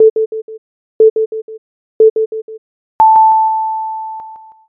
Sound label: jiho